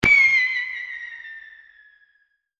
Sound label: firework start